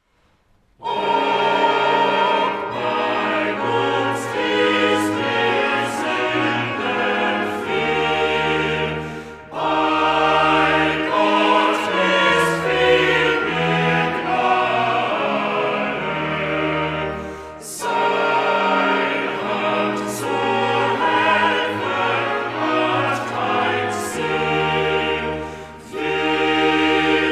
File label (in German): Cantata, Choral; BWV 38 'Aus tiefer Not schrei ich zu dir'; VI. Choral 'Ob bei uns der Sunden viel'; Bach; Greenwich; 21st Sun After Trinity